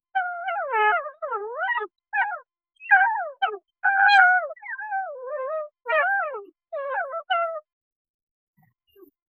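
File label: clean high quality, cute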